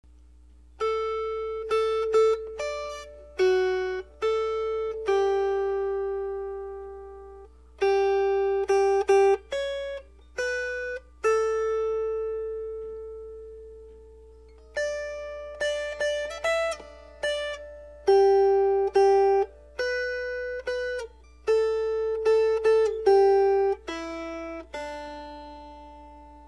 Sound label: Melody